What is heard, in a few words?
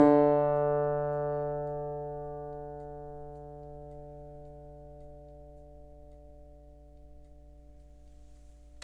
Country Dobro Ending; Digidesign; Pro Tools; Pro Tools Essential Demos; Essential Loop Demos; Demo Session - Country